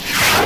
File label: door